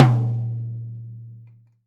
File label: Tom Hard; hydrogen drum samples